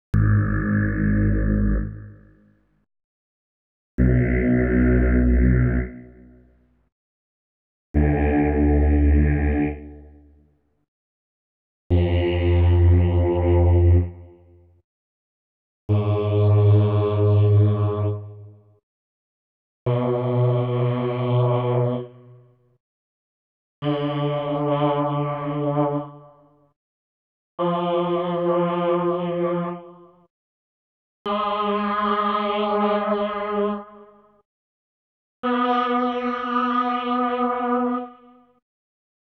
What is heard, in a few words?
Female Male Fairlight G#+3, Choir & Voice; Klaus Schulze Collection